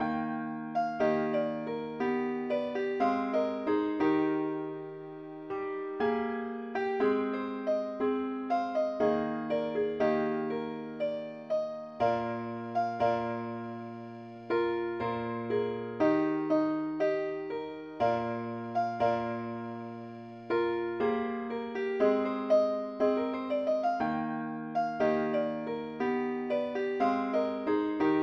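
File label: keyboard only key e flat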